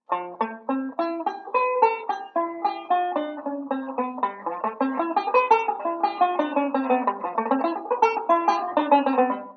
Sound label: ii V Speed Drill, banjo; Beyond Chord Melody Volume II